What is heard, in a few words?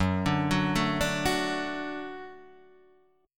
D Major F sharp